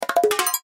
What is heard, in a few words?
Percussion Jumble; Cartoon; Cartoon Music; Cartoons; Blastwave